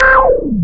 Anasound Short hi C, Industrial Analog Single Notes; Sonic Foundry (Sony Creative Software)